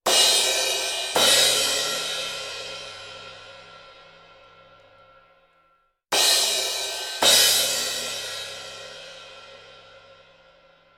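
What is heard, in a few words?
cymbals, tube; lewitt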